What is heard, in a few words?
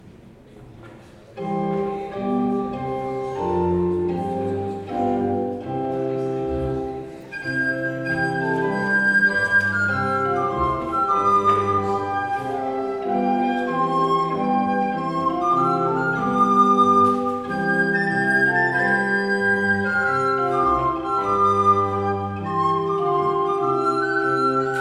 Trumpet Tune; Jeremiah Clarke